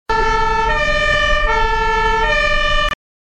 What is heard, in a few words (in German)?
Feuerwehr SMS-Ton